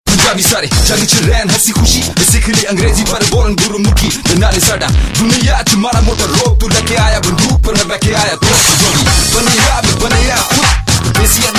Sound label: Punjabi Flavor